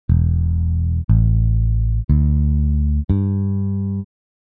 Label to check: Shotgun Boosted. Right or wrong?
Wrong